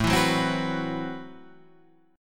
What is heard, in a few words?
A Minor 6th Add 9th A, Chord; Am6add9 Chord {5 7 4 5 7 7} strummed